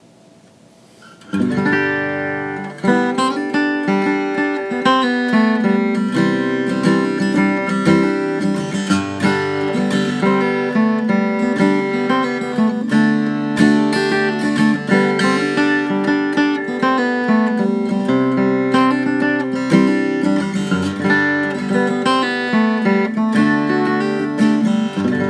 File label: klepper g; The first hour after tuning it up